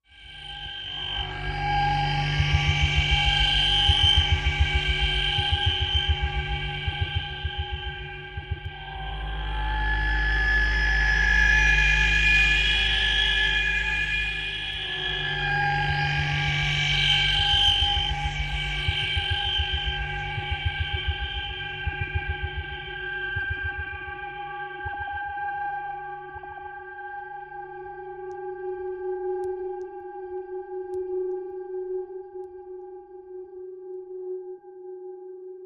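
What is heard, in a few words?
Dark Spiral Low Vibration, energy beams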